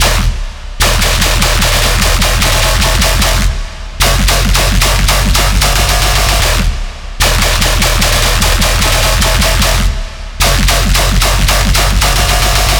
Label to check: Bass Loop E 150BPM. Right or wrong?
Right